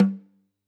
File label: K1 close Snr Off